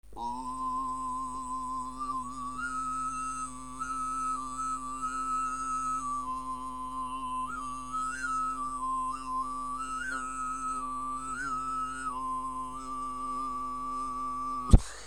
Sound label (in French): voix de gorge grave, adaptation de musique classique